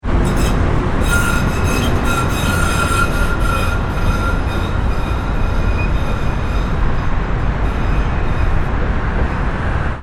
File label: Muni Brakes